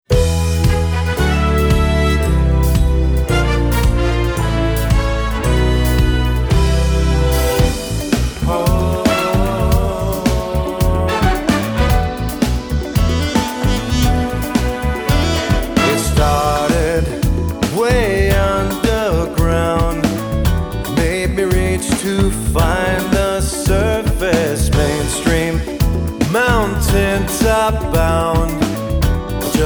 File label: big band